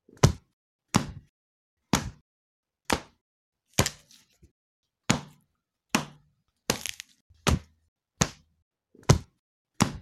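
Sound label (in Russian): удар и перелом костей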